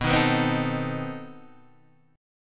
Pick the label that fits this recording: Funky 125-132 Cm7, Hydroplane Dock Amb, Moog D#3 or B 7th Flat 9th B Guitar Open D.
B 7th Flat 9th B Guitar Open D